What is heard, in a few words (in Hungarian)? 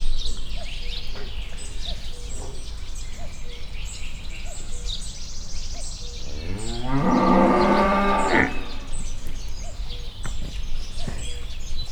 érdekes hangvég, magyar szürke szarvasmarha; miskolc zoo